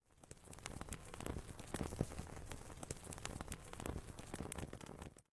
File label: Incense burn; Phasmophobia